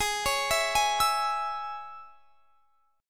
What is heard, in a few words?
Ab Suspended 4th Sharp 5th; arpeggiated; Absus4#5; x,23,23,21,21,24